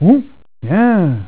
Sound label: huhh